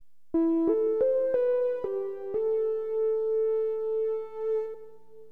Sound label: SINE LD, HipHop, Keyboards; LiveAct Pool 1